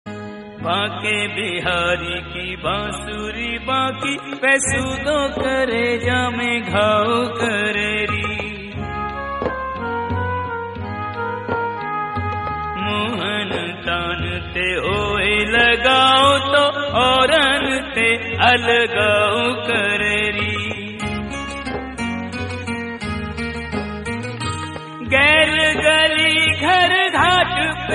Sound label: Bansuri